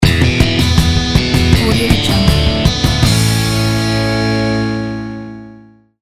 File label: guitar break